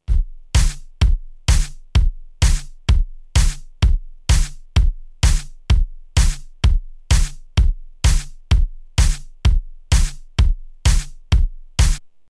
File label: Pan